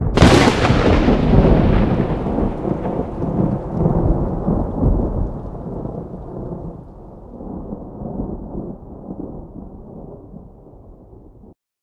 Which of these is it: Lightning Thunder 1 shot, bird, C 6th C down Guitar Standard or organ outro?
Lightning Thunder 1 shot